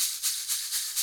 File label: RAINSTICKS; WorldSounds